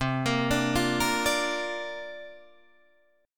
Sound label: C 7th Suspended 2nd Suspended 4th C down Guitar Drop D